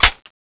clip in; tfa cso

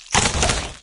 evade left novoc, maggot; doom3

